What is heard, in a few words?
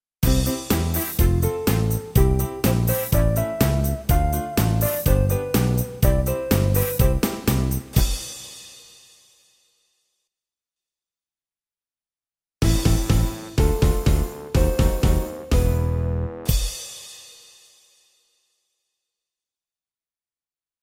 Csardas